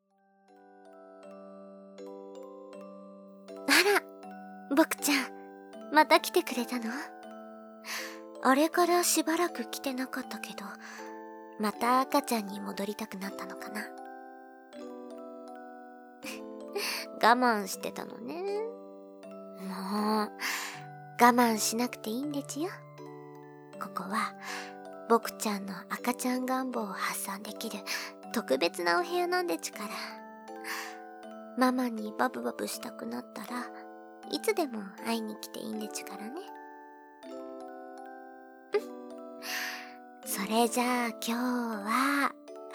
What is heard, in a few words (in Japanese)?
オルゴール ver., ASMR; うさぎさんコース